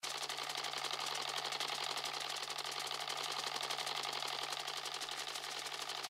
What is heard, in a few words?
Reel Spin; Crazy Rockets